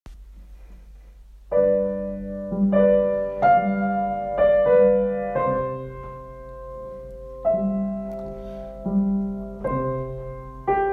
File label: Jingle